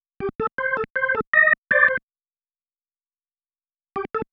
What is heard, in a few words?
Single Riff, Organ